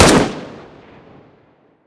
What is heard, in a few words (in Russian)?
vistrel iz zabroshennogo zdaniya po vragu